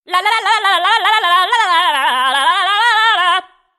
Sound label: crazy lalalala